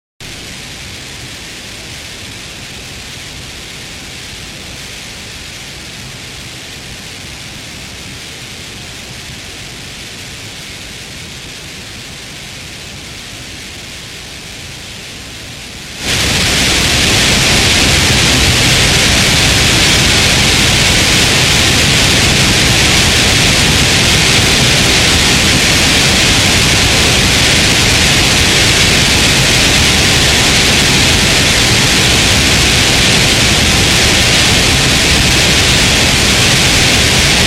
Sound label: Morning Noise Broadcast